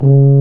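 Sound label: brass, C Tuba; Tubas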